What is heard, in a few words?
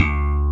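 digital bass; AKAI S-Series CD-ROM Sound Library VOL-2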